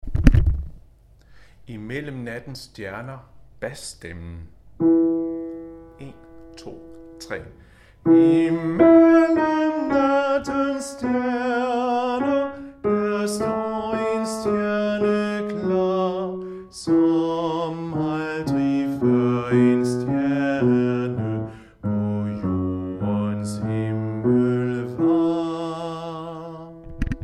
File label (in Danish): bas